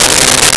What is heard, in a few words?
Bari Sax C3; Nintendo; July 2020 Gigaleak